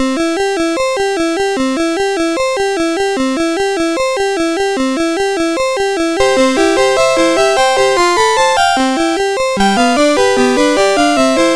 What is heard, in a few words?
Sid MOS6581R4; Sid Editor